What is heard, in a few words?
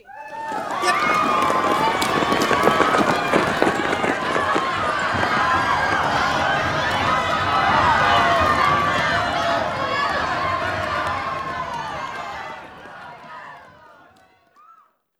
airport run screams stereo